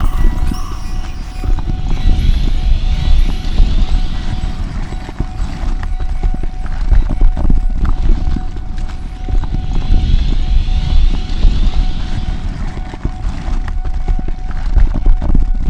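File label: lithoid ambient defense station idle hum; Stellaris; lithoids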